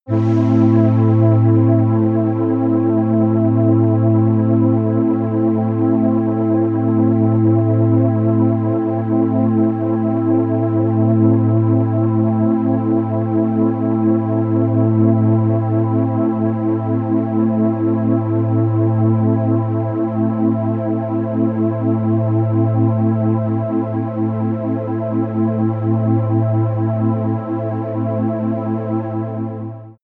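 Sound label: hallow air, pads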